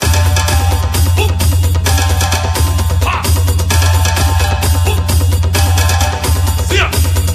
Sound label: Jingle Result; Taiko no Tatsujin DS Touch de Dokodon